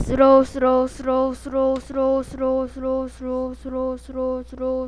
throw

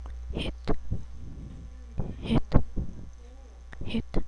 hit

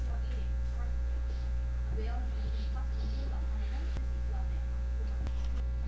ambulance

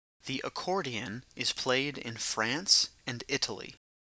accordion

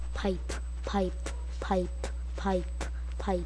pipe

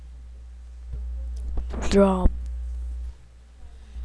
drum

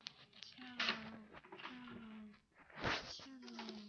cello

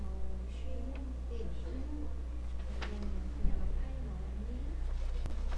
basketball

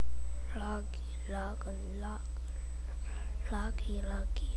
laugh

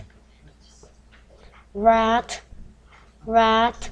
rat

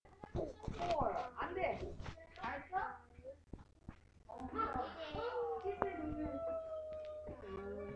pipe

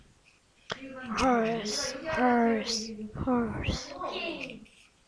horse

